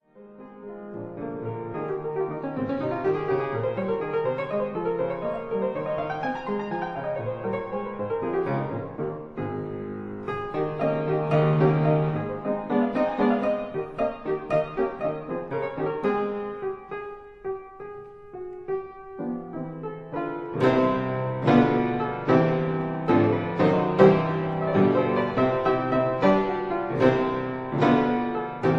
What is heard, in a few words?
caprices, for piano; Amusementes (3) en forme de caprices; Op. 105; a la Autrichienne